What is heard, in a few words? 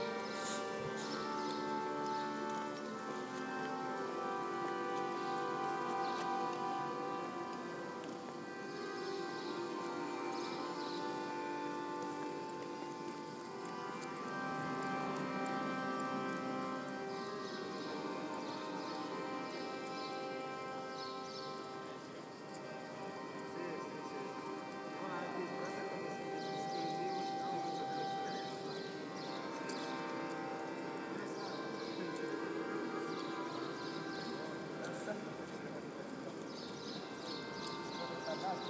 organ player of piazza della pilotta